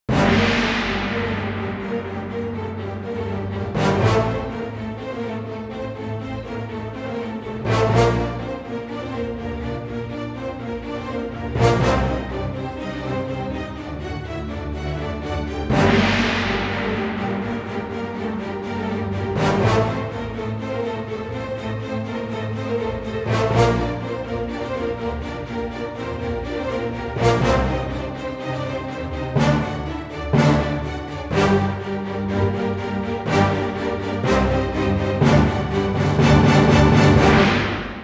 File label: Strings Brass; ProScores